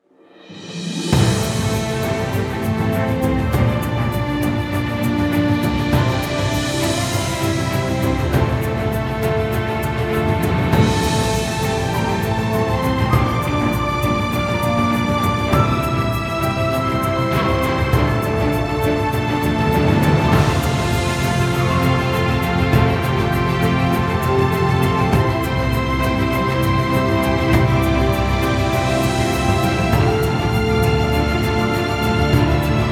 Adventures Ahead More Drums

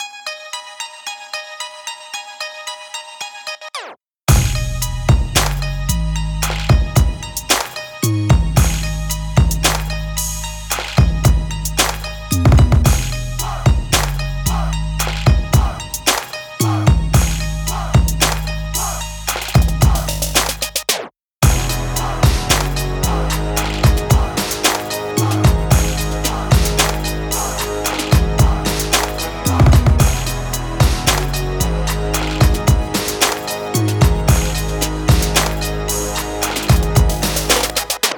Battle Hop, urban

